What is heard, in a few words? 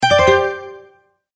multimedia tone alert; Tones (Sound Effects:Sound Design); McKinney Sound